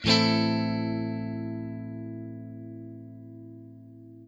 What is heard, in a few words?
CHORD LN M, guitar; Quantum Leap - Guitar & Bass